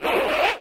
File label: zip fastening, zipper